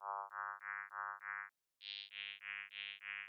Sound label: bright bright diff